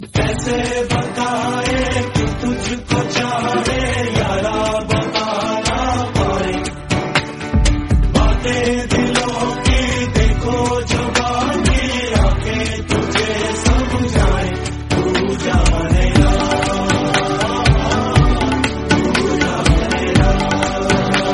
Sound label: hindi song ringtone